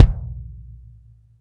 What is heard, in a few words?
BD, Bassdrums, Roland TD-20